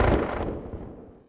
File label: mg end echo